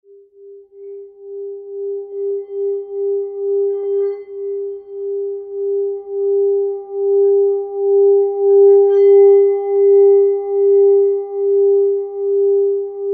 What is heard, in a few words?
japan black racko rubber